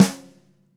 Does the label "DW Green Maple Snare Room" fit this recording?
Yes